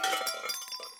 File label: Scrap; Pocket Planes; android